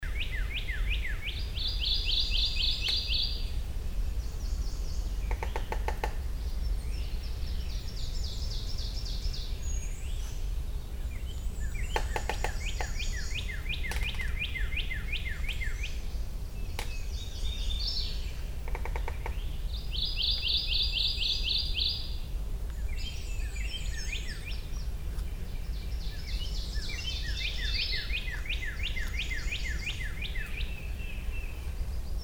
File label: Kentucky Warbler; Wicomico MD